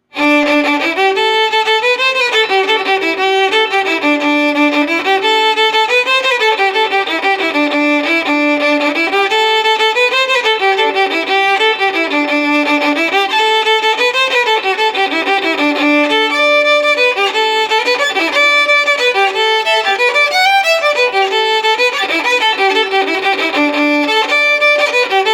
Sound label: Jig